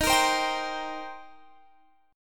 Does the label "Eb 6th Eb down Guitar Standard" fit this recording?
Yes